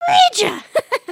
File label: excited, emotes